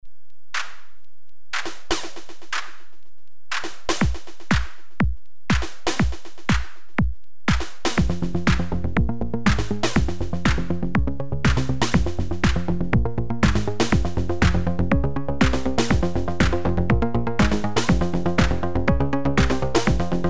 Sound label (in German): drum und waves Melodie